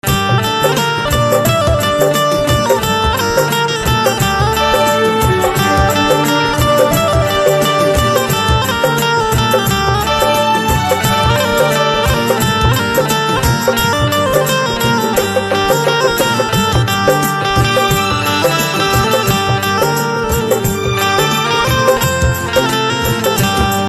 BANJO RINGTONE

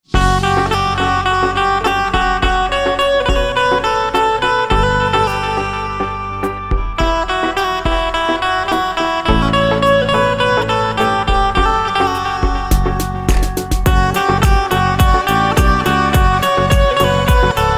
Banjo Instrumental Ringtone

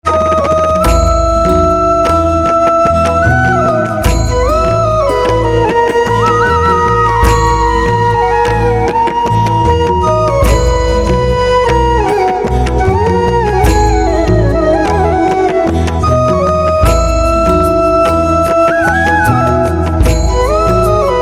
Flute Instrumental Ringtone; devotional instrumental ringtone

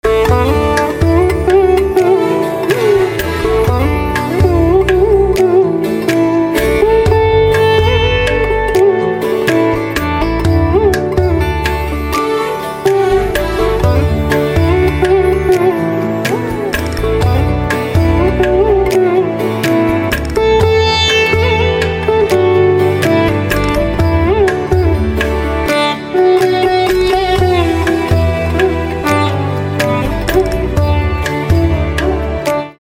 Sitar Instrumental Ringtone; sitar and veena

